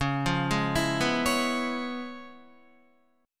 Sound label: Db 7th Sharp 9th Db down Guitar; Db7#9 arpeggiated; JGuitar